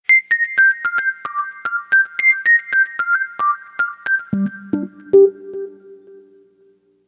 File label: Smooth Sms